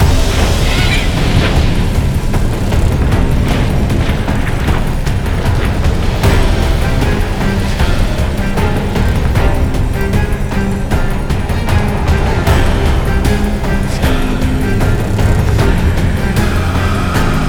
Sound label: Big Win Loop; netent